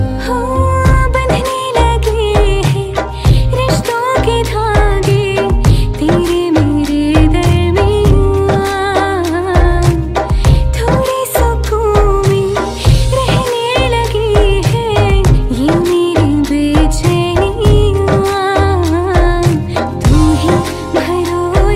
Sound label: Bollywood Song Ringtone